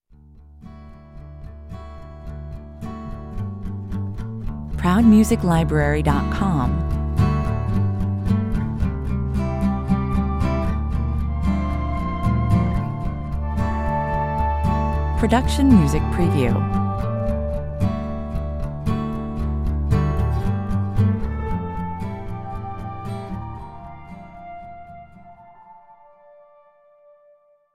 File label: warm electronica guitar 114 bpm